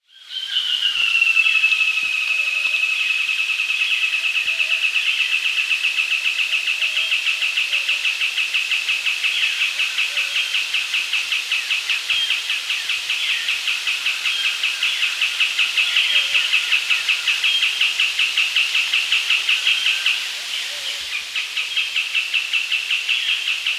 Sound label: Southern White-Crowned Tapaculo (Scytalopus Bolivianus), Andean Birds; S. America